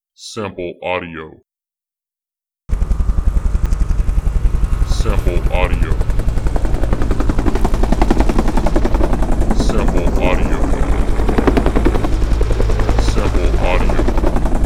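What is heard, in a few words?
Helicopter Huey Hovering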